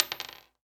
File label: coin; 99Sounds; Sound Design Tools; Raw Foley